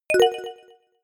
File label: notification short bell